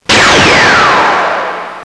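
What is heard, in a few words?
LASER SHOT, MILITARY; Easy Personal Web Page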